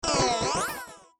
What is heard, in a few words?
alien pet